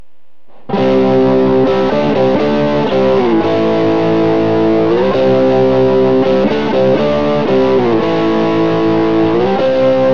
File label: neck crunch; dragonsplit; Burny